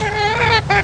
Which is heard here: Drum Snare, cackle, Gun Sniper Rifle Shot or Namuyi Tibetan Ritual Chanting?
cackle